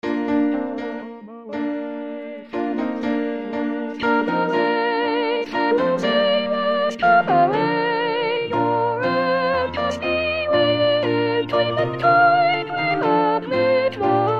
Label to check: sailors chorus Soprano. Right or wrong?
Right